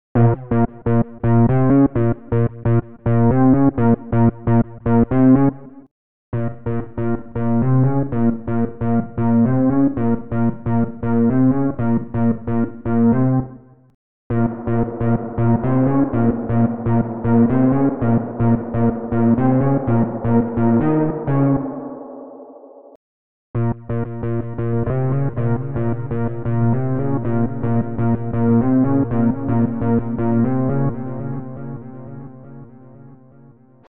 Synth mit Echo auch mit Detune

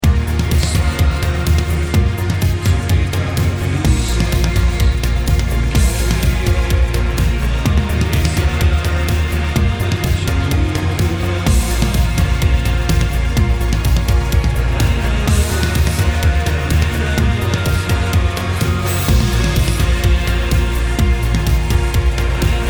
mit Multipressor

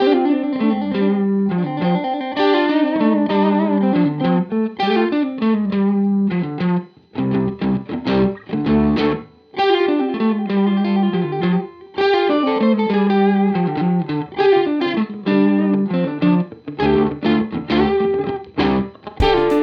Walrus Audio ACS1 Dartford Viel Gain Room

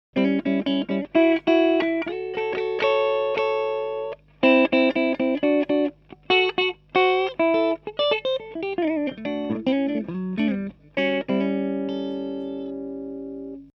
HB beide clean

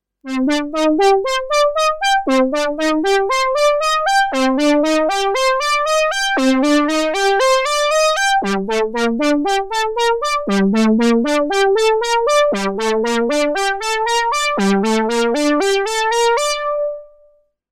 Monopoly Solo Sequenz

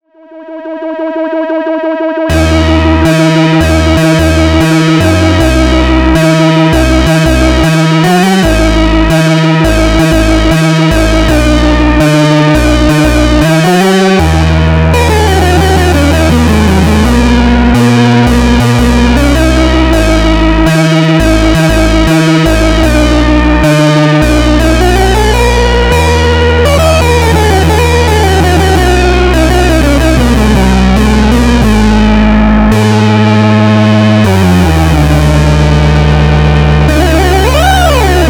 Parafon handgespielt

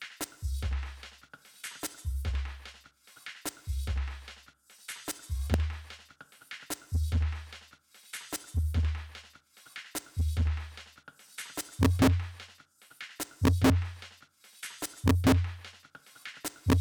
Elektron Model Samples Uebersteuerung bei hoher Resonanz

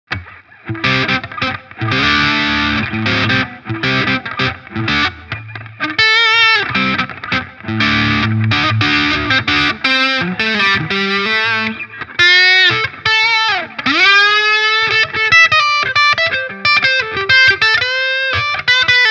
KALUNA PRS Hals Gain max.; Vahlbruch

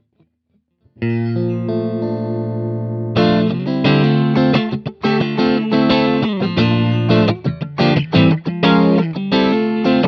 Hals und mittenposition

